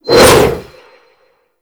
Arch Wing Sword Whoosh; Warframe

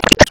Short Sword Impact Flesh; Weapon Impacts; Swords; Dendrit Short Sword; Combat

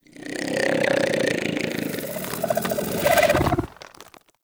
Bug Vocal Snore; Characters; Warframe; Hunting Critters; InfKDriveBug

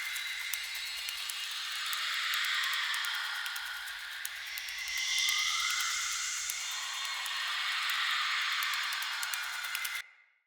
Energy Restore Butterfly Courage Loop

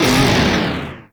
Proj Large Fire; Warframe; Lizzie

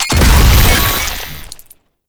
Proto Infested Maggot Explosion; Warframe; Abilities

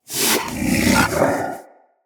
Vocal Breathe, Hunting Critters; Characters; Predasite

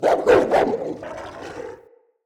Pack Wolf Attack Bite; Animals

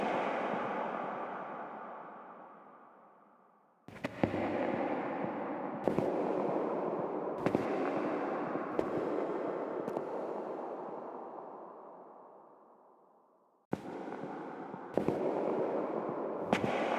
Steps Operator Far, Foley; Warframe; Cinematics; Apostasy

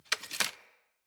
Bow Ranged Arrow Reload Grab; Weapons; Envoy Hunter Bow

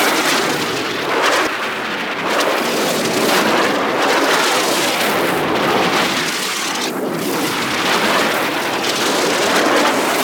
Hoverboard Turning Loop; Warframe; Vehicles; Venus

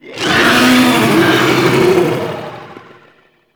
Sentient Attack; Enemies; Warframe; Tau; Sentient Dactolyst

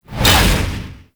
Heavy Punch Whoosh; Warframe; Duviri; Dax Heavy; Abilities

